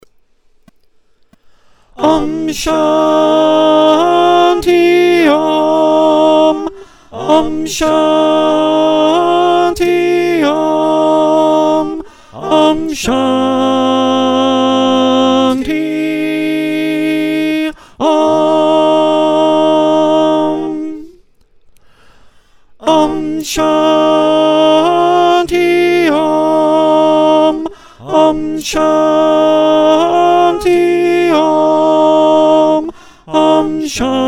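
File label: alto, choir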